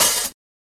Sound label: HiHat